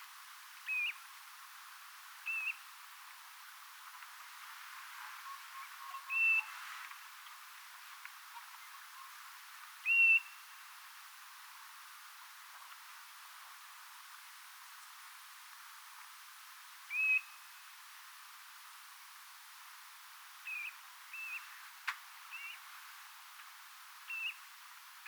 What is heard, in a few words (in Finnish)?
kapustarintanuorella aika samankaltaisia aania kuin tyllilinnulla alussa kaksi tyllin aant